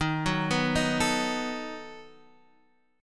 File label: B 7th Eb